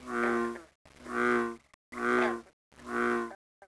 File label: giggle run